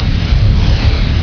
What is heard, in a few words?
cloud; mk2